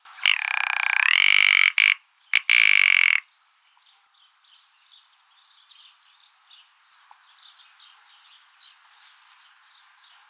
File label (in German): alpenschneehuhn gesang; hans-heiner bergmann; Expedition Kesch